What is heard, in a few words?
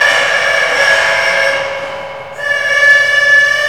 WING; Rarefaction; Poke in The Ear With A Sharp Stick